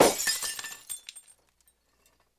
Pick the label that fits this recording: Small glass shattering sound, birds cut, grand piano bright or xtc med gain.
Small glass shattering sound